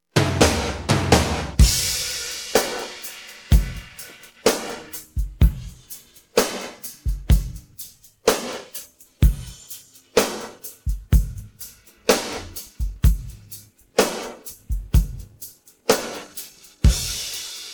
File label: pattern, drums